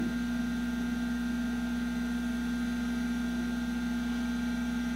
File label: ambient